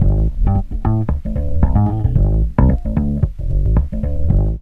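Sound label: FUNK BASS; INSTR LOP; E-Lab; X Static Goldmine 2; akai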